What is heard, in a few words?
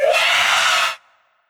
Banshee; Banshee V O